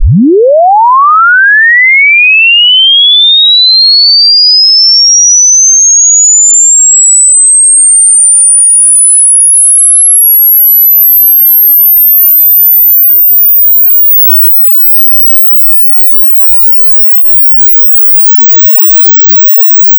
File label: upsample sweep 1 to 22KHz; 64bitfloat